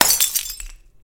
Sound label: glass step; midnight guns